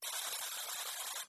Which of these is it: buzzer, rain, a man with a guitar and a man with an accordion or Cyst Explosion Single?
buzzer